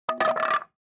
Pouring firewood

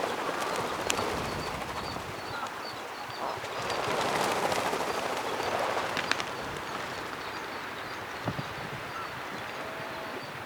Atlantic puffin

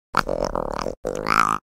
Salamanders; سمندر

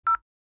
cell phone

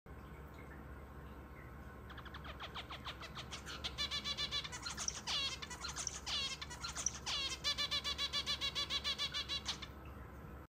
Java sparrow

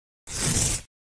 Opening paper roll

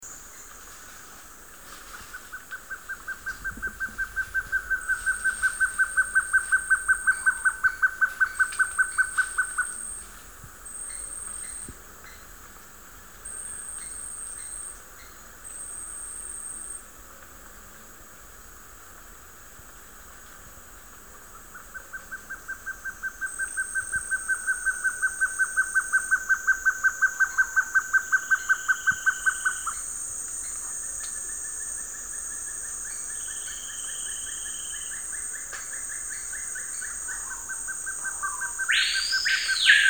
Guianan trogon

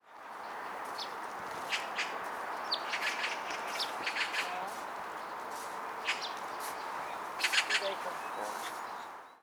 Oriental magpie

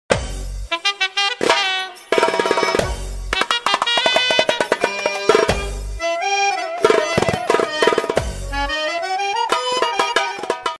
Percussion

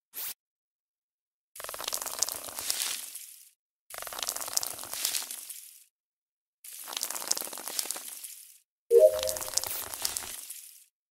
Watering potted plant; اب دادن به گلدان

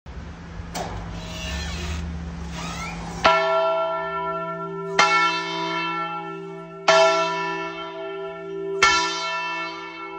big bell

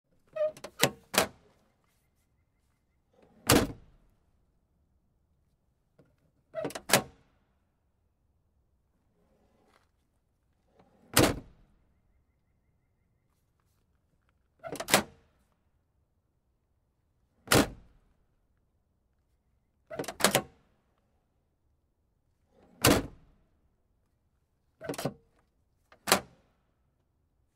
Car trunk; باز شدن; صندوق عقب ماشین

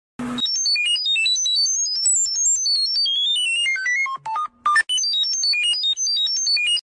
beep electronic devices

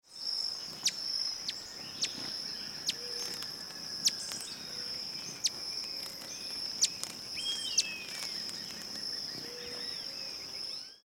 Green throated Mango, پرنده

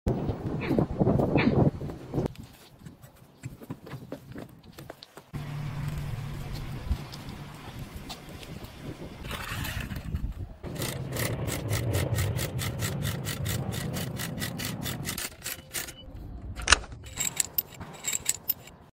car jack; بالا پایین رفتن; جک ماشین

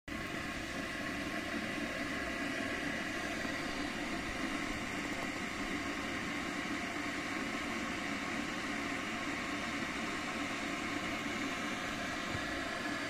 Electric Teapot; چای ساز برقی

